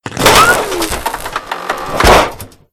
eyebot death; freak fortress 2; fallout new vegas

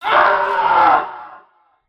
scream

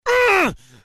hurt

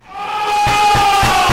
knocking killer; tf; noisemakers

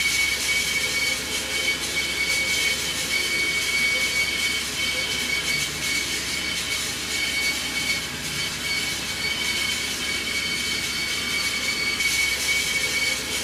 chase; A Machine for Pigs; wretch

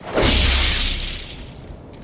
sword hit; freak fortress 2; master chief

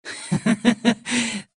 laugh short; Adachi

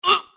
hurt

